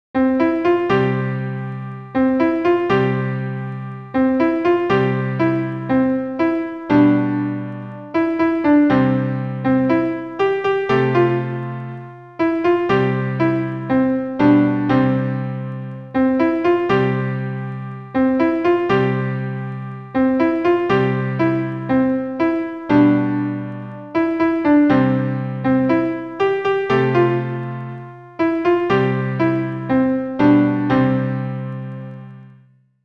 When the Saints Go Marching In - piano arrangement